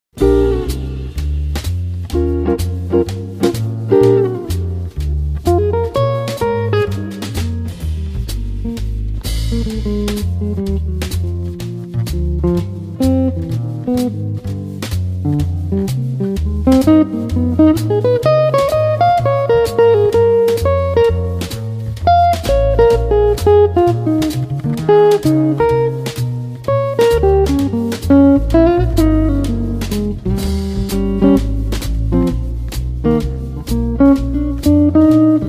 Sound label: Jazz Sample